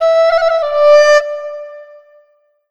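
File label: Chinese FLUTE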